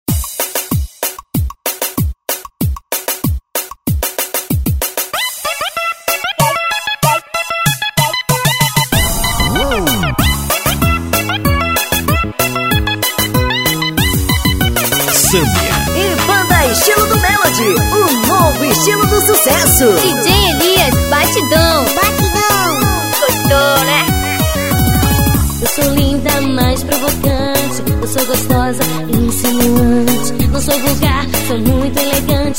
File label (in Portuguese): melody